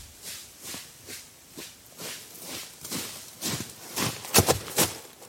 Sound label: footstep on leaves; human